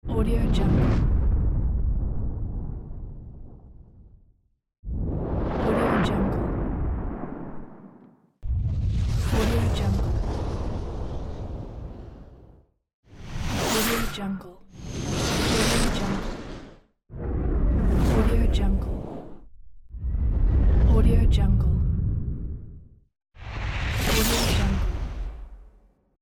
energy swoosh; cuttlefishm

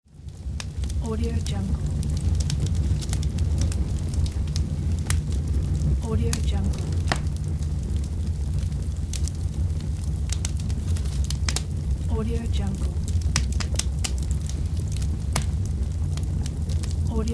fire

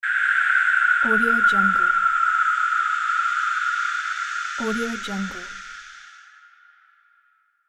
ghost scream

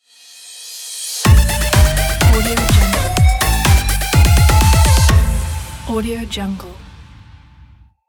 stomp the runway logo; trackstax

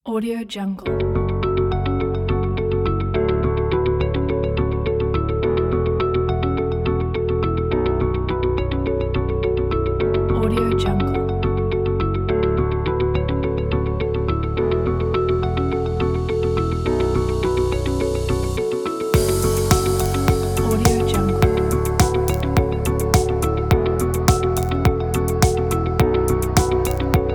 ambient technology music

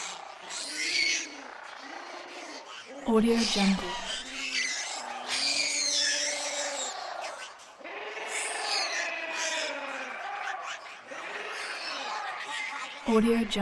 walla monkeys and birds in jungle

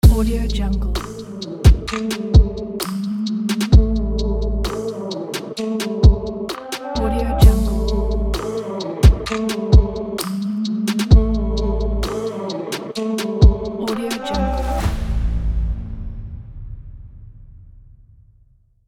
chill hip hop ident

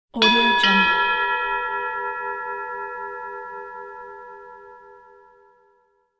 mass liturgy gongs hi low

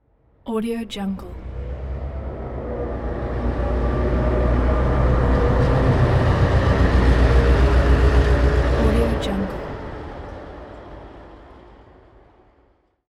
locomotive

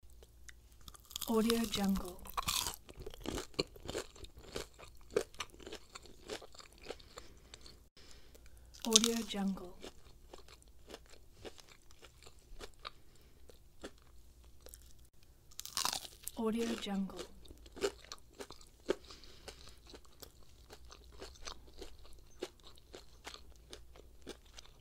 eating crunchy food

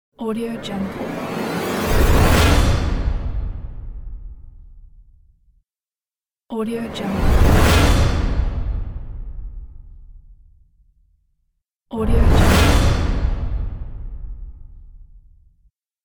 fast whoosh; bequadro

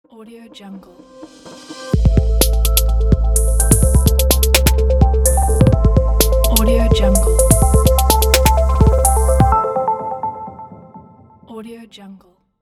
news podcast intro glitchy logo

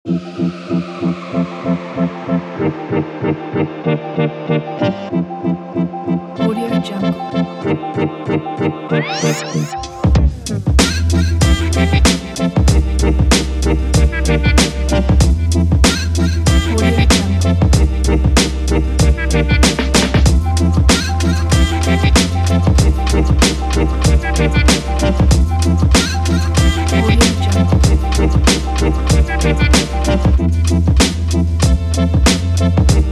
vlog trip hop

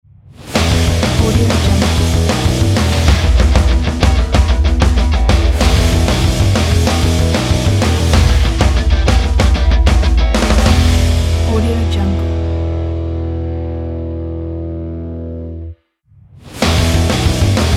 action rock opener ident

